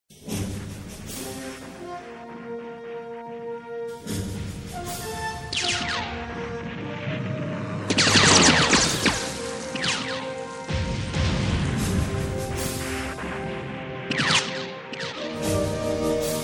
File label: MUSIC